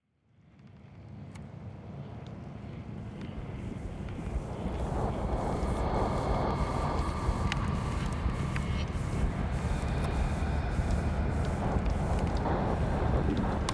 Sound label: Forgotten Forest AMB Only